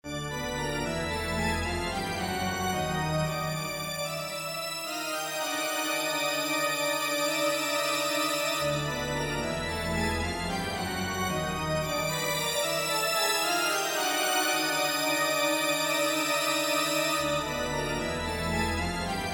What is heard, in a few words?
the horror church organ